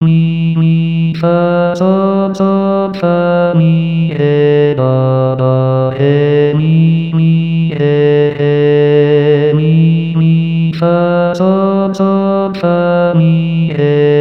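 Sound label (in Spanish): oda a la alegría melodía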